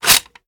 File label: bolt, mg3; tacz; daffas arsenal